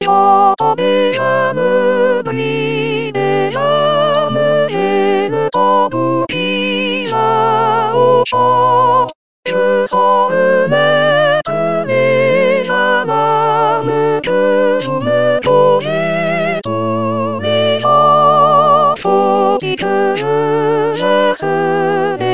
Soprano; L'homme armé

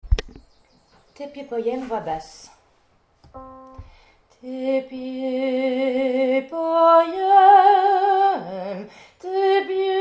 Basse

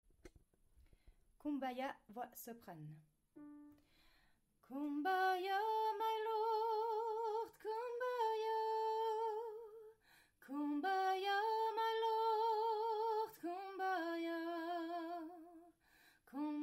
Soprane; Kum ba yah

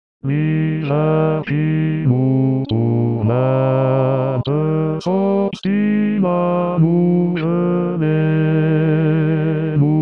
Basse; Lully - le choeur des trembleurs

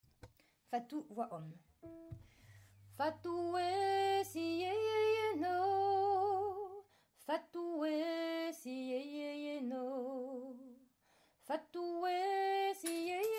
Fatou Homme

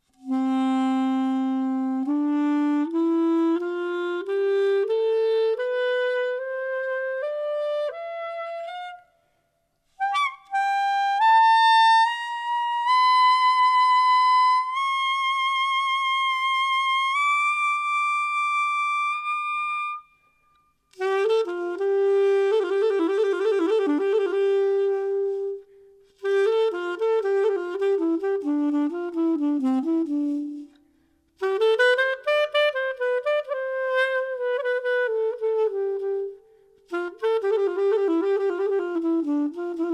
clarinettes

